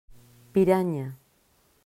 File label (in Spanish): pirana